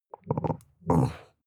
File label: chihuahua growl muffled; natur-tiere; hunde